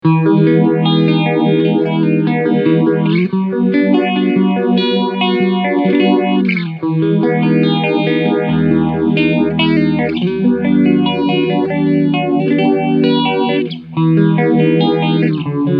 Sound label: JHS Pedals 3 Series Phaser Feedback OFF